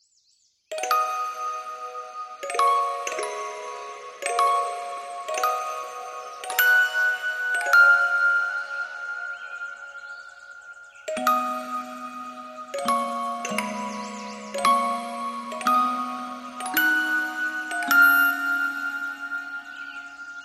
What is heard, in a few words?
Sleepy Girl and Sound of the Nature